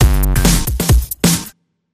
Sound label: Sting; Power Button